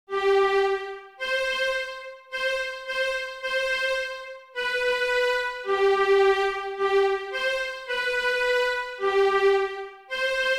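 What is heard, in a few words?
Happy Farmer Viola 2; Schumann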